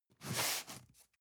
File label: cardboard box medium slide short; objekte